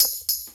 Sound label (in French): tambourin; percus